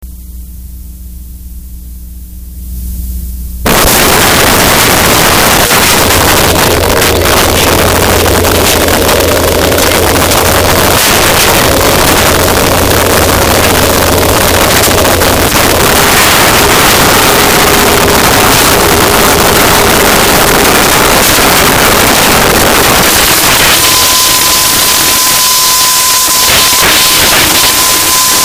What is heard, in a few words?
dentist chair